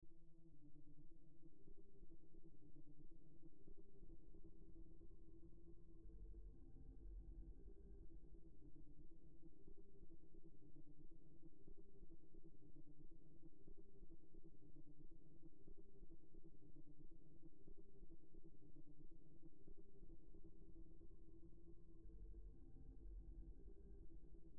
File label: ambiance